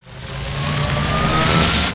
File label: howl; creatures organic